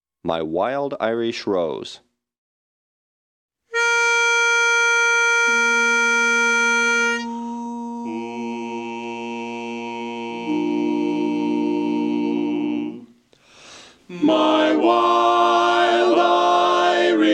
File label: Tenor